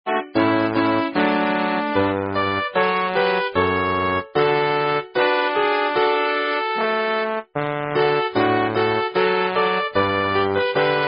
Melody Tune; Frau Wirtin

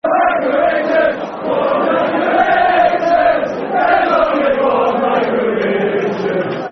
One Michah Richards, Football Chants; Man City Mcfc

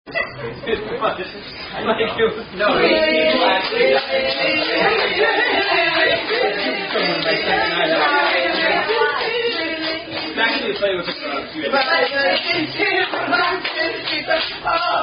Hand Clapping Game

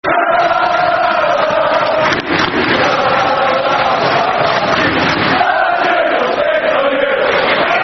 Football Chants, Bolton Wanderers; El-Hadji Diouf Will Spit On You; Bolton Wanderers Bwfc

